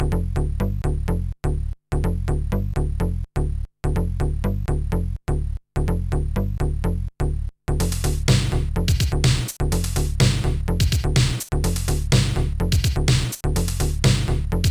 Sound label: funky clarinet; Paula; Digital Sound Studio